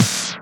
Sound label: Percussion; Percussion Instruments; Vengeance Samples